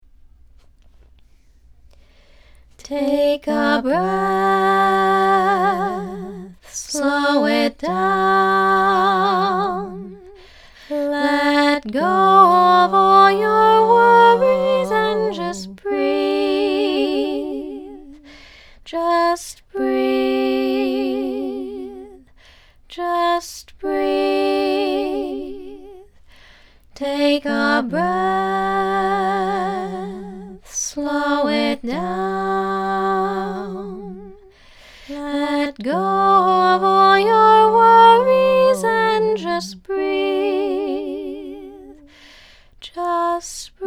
Melody Low Harmony

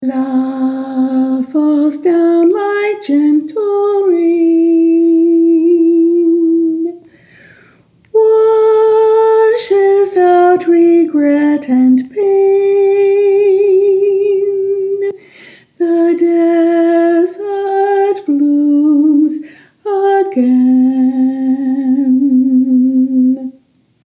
melody only, choir

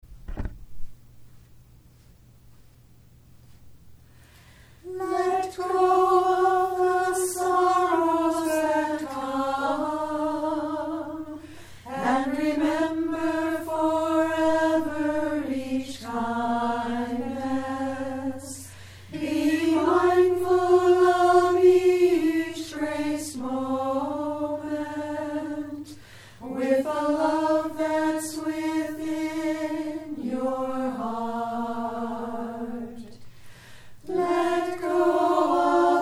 Melody and Harmony, choir

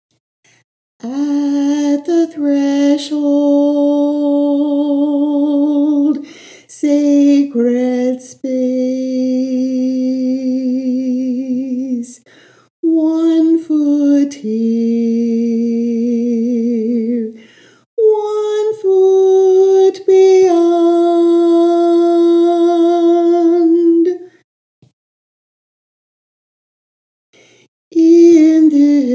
low harmony only

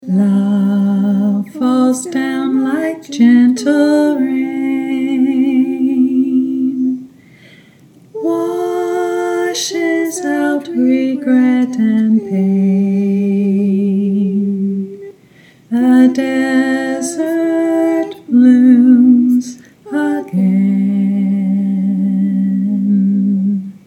low

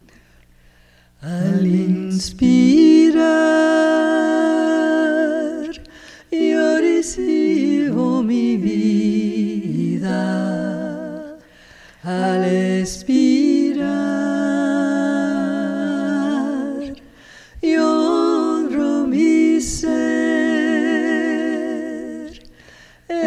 a cappella

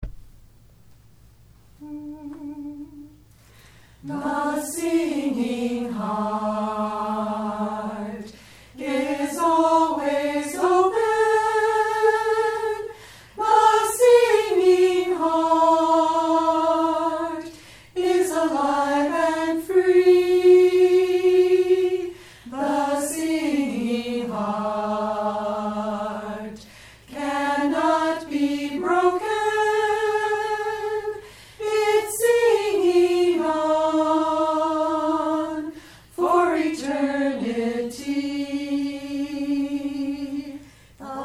Melody, choir

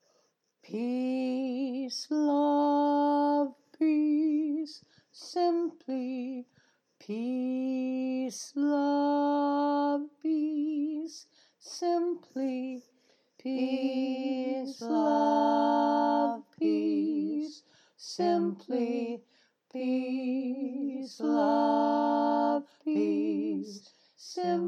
stacked harmonies

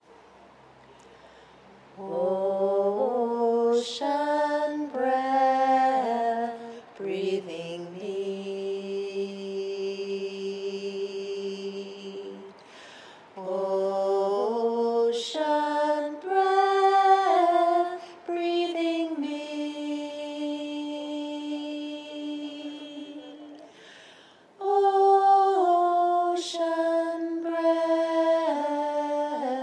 Round

